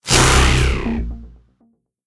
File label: projectile; smite; god raijin